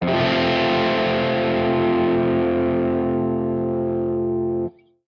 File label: guitar chord; Summer Catchers